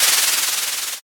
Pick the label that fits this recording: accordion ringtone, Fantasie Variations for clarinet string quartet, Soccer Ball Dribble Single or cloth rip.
cloth rip